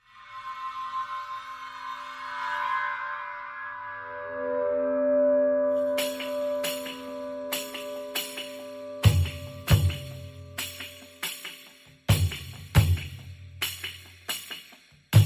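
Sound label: Percussion and Pads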